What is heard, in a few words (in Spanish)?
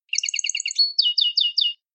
gorrion; campero